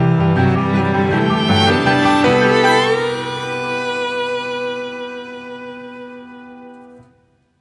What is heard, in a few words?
Music Level Bubbles Ending Mix